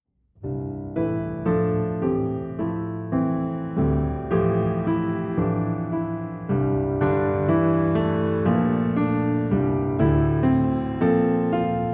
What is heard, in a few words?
Improvisation